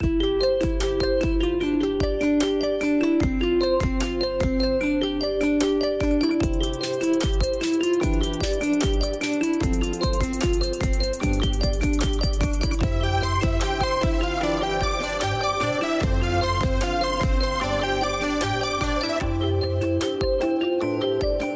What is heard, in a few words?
Xiaomi Mix in Remix